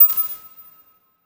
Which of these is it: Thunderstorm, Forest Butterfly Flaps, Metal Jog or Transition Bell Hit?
Transition Bell Hit